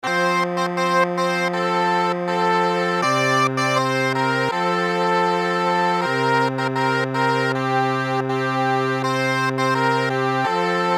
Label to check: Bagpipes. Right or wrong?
Right